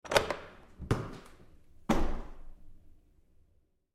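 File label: Door Bathroom Stall Open close-up Lite with Lock; Lite W-Bounce; BigRoomSound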